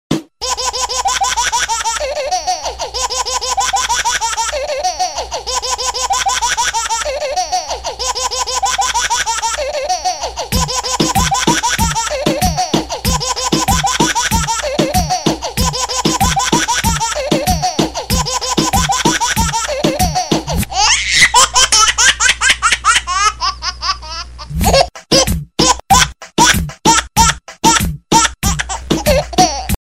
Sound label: Baby Laughing Remix Ringtone